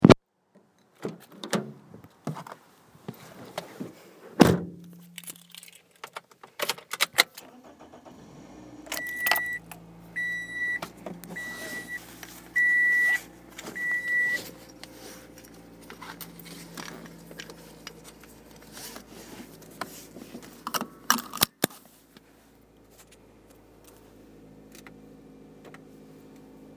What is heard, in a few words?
Car